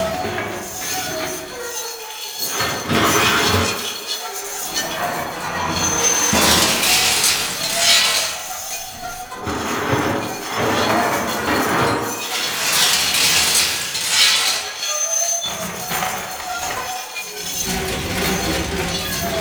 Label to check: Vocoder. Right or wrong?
Wrong